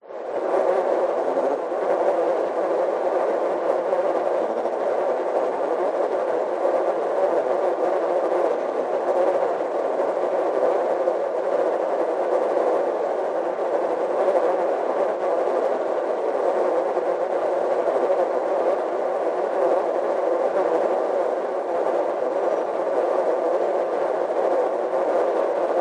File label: sound design swarm buzz loop supernatural wide; Skyclad Sound